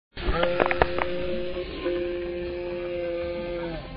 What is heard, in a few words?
ahhhh